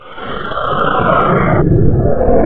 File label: FX REVERSE